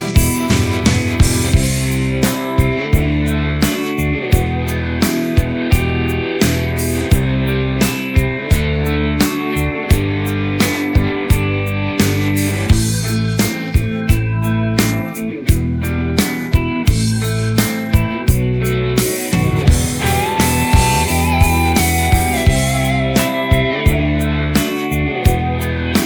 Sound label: no vocals; htdemucs 6s